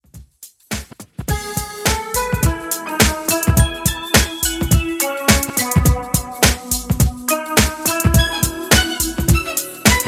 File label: Classic 80s, 105 BPM; ReDrum; Dj Intro Outro